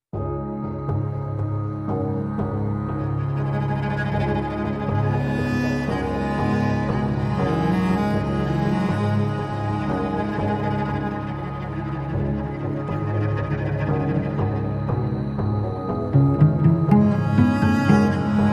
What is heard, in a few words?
Cello Mix